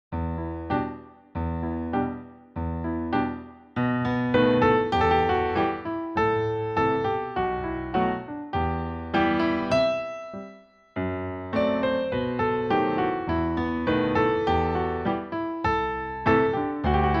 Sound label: Barre Battements Tendus Glisses, audition music; associate audition music; Pre Senior